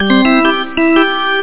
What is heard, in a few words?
CHARGE; riptide